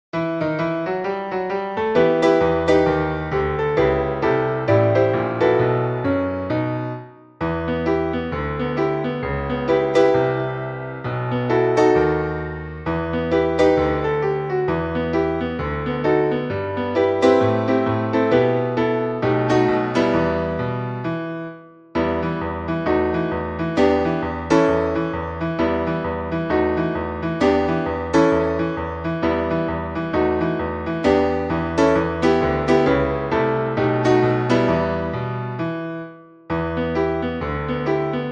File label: PIANO